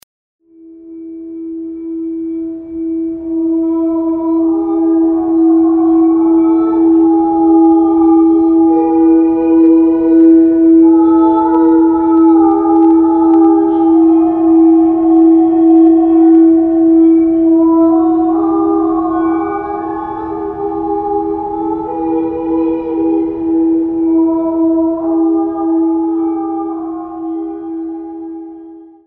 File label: celestial chanting extract